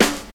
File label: Juicy Snare Drum D Key, acoustic snare; snare drum samples